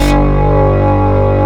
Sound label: OB KNIFE G1, OB Synths; E-MU Formula 4000 Series Vol. 3; Analog Odyssey